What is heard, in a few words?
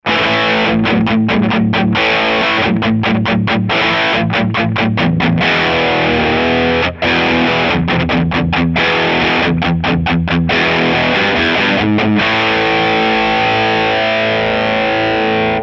drive, mesa